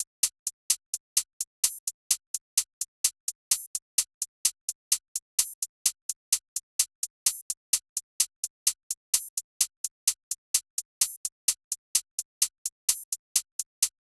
128 hats loop; D&M - House World Construction vol 2

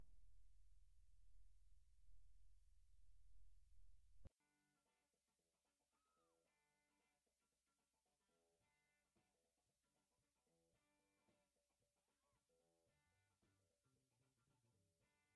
Keys

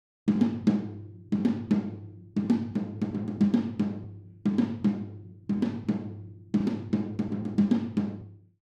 Room Tom

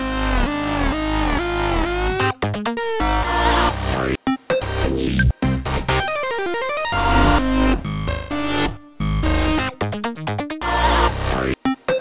Drop

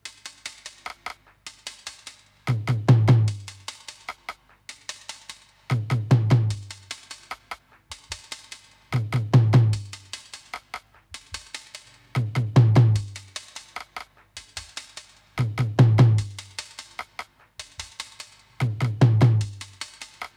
Linn Loop

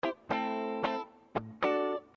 Guitar

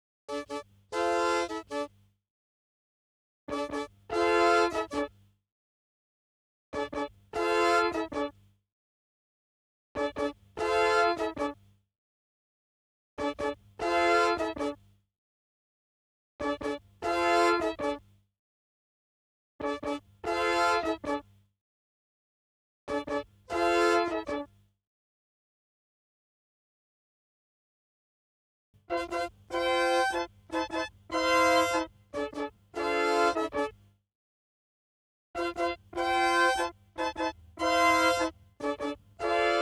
CMI Trumpet

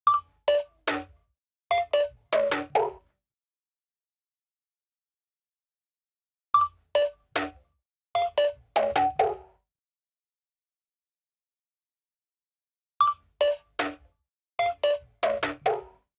Beep And Perc

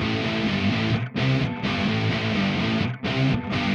gtr riff

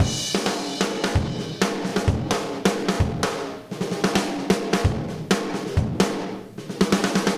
Drum Loop

